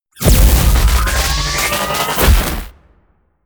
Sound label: wind up